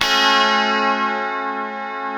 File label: G 3B FUNK CHD, Guitars; Spectrasonics; Hans Zimmer Guitars VOL-1; FUNK CHORDS